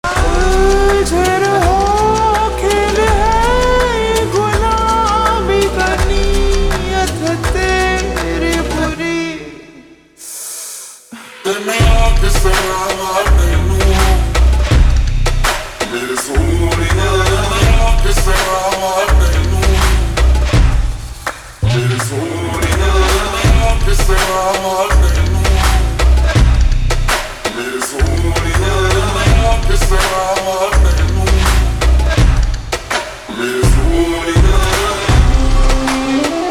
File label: Slow Reverb Version Ringtone